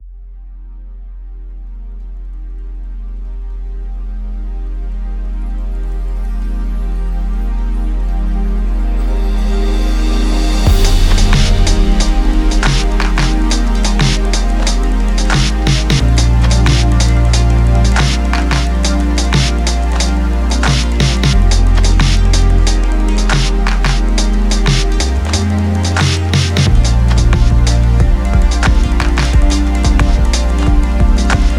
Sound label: lounge Medium Warm; LANDR